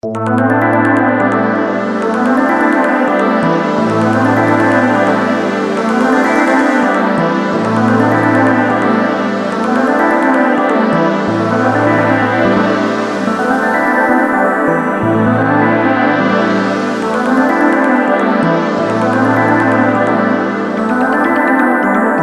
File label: Melodic Tech Voices Juno Ambi; Vengeance Sound Avenger 2